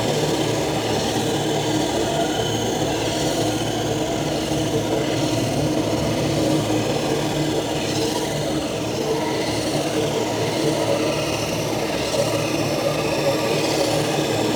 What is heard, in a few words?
Outpost Cave Door Loop; The New War; Ambience; Narmer Outpost